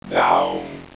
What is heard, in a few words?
gg down; dod; gungame